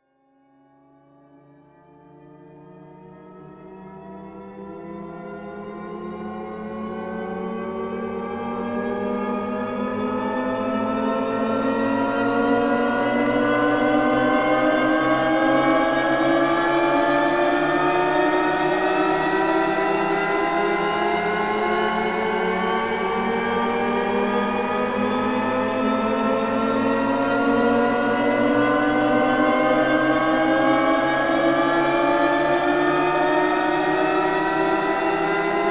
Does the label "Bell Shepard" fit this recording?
Yes